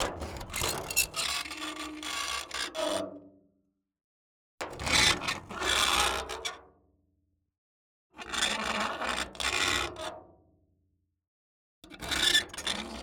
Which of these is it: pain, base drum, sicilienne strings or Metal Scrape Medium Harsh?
Metal Scrape Medium Harsh